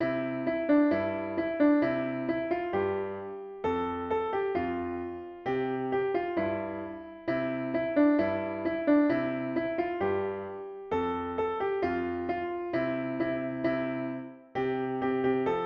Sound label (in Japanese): ピアノ